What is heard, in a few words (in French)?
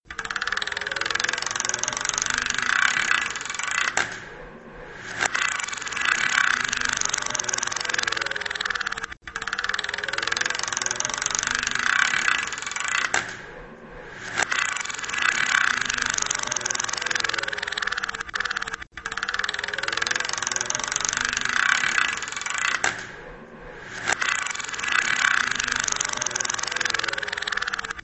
Baguette radiateur